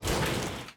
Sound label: Cage Disappear; Col Cage Disappear